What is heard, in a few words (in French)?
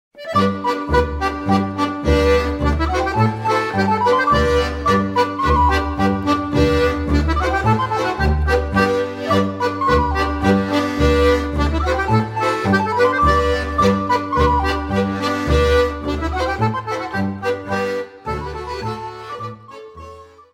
Polka, Belgique